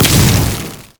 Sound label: execute; LoL; darius norseking